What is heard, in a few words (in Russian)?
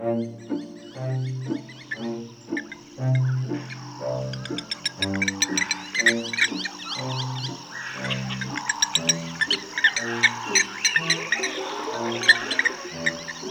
afrikanskii orlan krikun i dlinnohvostii chibis; v dikoi afrike; zvuki prirodi